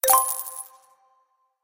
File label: coins